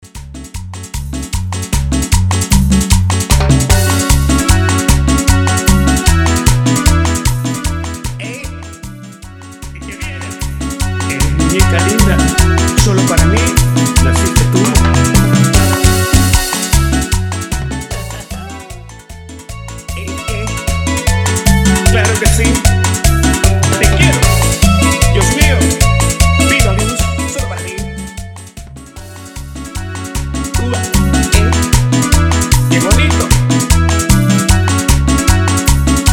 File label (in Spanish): Cover Instrumental Ec, Bpm 152; Dimax Dj Mix